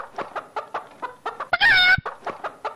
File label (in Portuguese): galinha